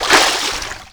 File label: water jump medium